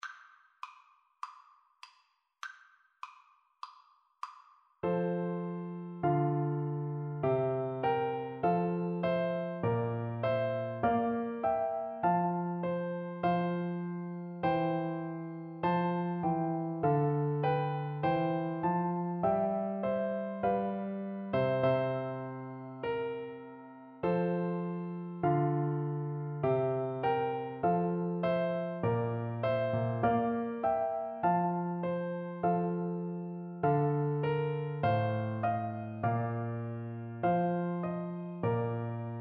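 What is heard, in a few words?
recorder karaoke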